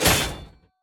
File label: Floor trap attack